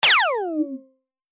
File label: long beam; pyuun